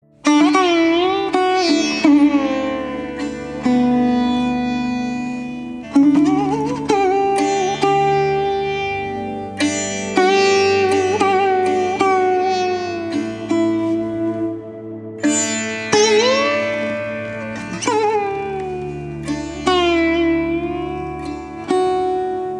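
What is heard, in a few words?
background music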